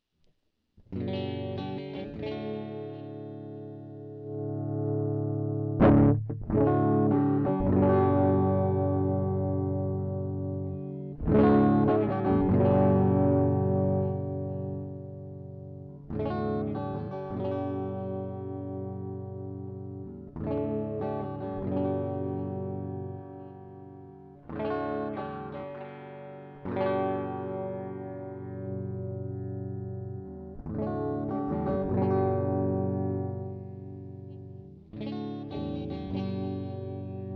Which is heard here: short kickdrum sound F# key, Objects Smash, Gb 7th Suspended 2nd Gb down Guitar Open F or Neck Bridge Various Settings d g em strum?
Neck Bridge Various Settings d g em strum